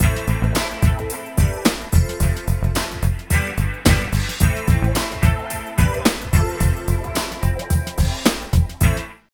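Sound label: LOOP, right; East West - Phat & Phunky CD2; 90's Sample CDs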